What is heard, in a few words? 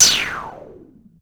EFX, SFX KIT; Wizoo - Platinum 24 Electronic